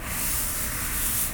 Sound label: swish